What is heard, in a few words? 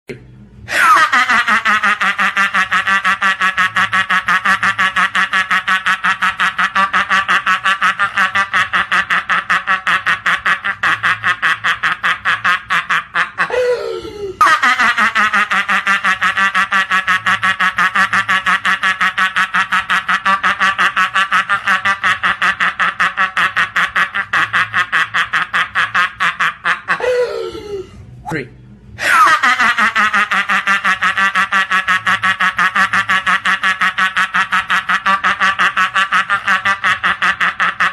1 minute of my laugh